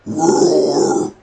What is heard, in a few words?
roar vap0r